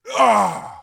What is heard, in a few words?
voice damage male; Blind Gladiator